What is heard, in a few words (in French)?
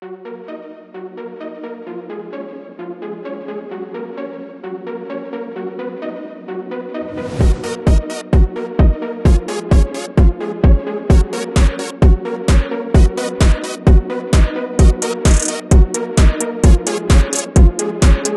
hymne versions karaoke; Naissance d'un hymne; Collège du Sacré Coeur